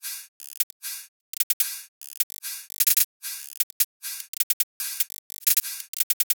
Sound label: Dubstep Drum Loop Cymbals, 150bpm; Full Drum Loops (Stem Seperated); Ghosthack; Dubstep Sound Arsenal